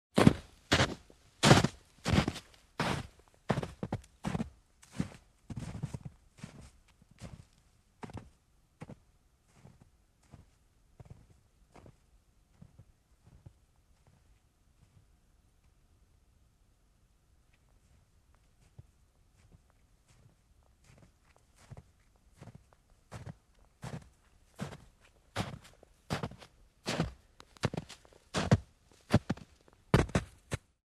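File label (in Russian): удаляется и приближается медленнее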